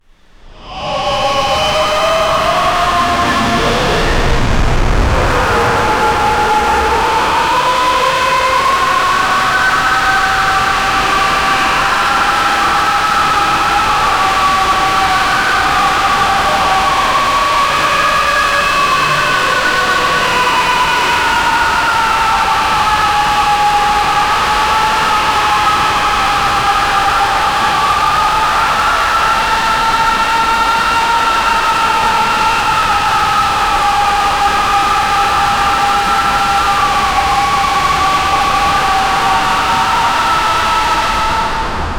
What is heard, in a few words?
supernatural horror movie, demon